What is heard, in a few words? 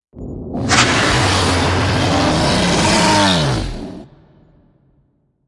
Evil Gate Scream; Ninja Tracks